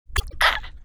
jerky, spirit; slender; dbd

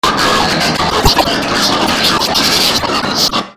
rbuzz; slender

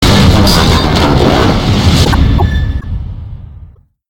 rbuzz catch; slender

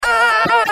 stun, spirit; slender; dbd

